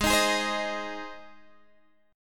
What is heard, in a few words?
Gsharp Major, Guitar, Half Step Down